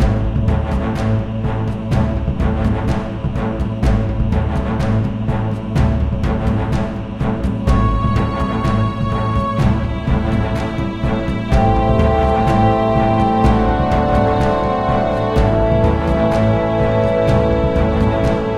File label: Sky City Build Up Low, gamerip